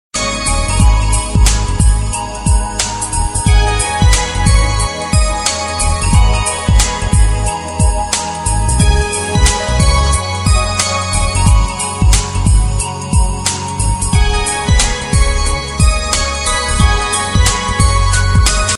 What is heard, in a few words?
Romantic Music